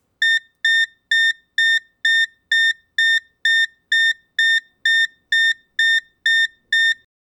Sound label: piezo inferna